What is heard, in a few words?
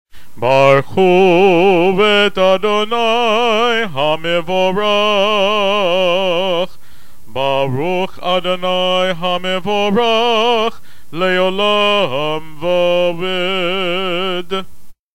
barechu